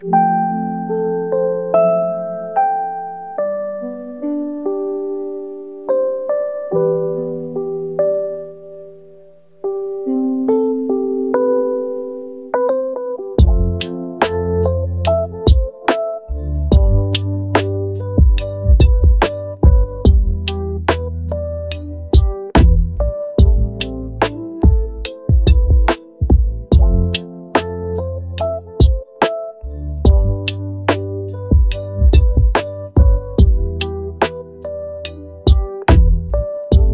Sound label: rainy lofi